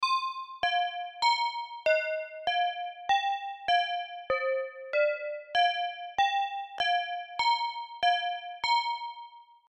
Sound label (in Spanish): campanas tubulares; reciclar tocar